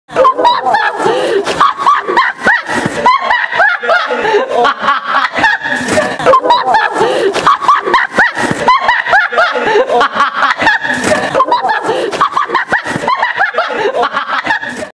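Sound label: Dolphin laugh; Smeh delfinchika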